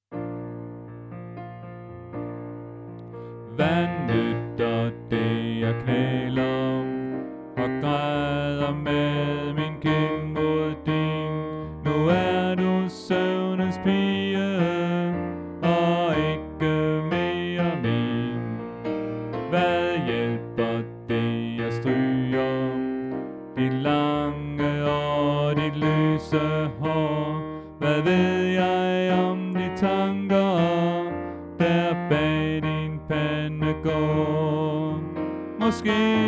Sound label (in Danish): Bas; oevefiler